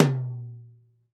TOM, Drums Acoustic; USB Soundscan vol.10; AKAI; RAW KIT 7

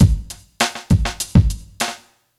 drum loop 100; Vinyl; Masterbits; Vinyl Attack

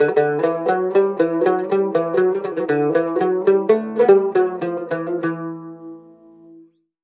CUMB, CUMBUS, Mediteranean Traditions

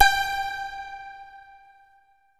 PIZZ G5, SYNTHSTRINGS; Music Store - Claudius Diemeter Schwerlos

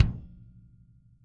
KICK

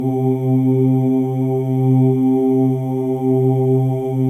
Oo, Male

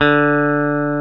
Elec. Guitar D2; Proteus 2000; Multisamples; Digital Sound Factory Proteus 2000

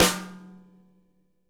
SNARE; drum

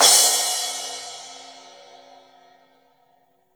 Raw Crash, Drums Acoustic; USB Soundscan vol.10; AKAI; 90s sample CD

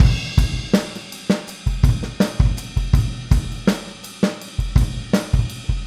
Boogaloo Chorus Full Mix, 82 BPM

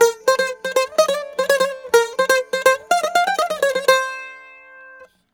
Mediteranean Traditions

